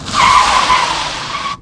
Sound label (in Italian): frenata auto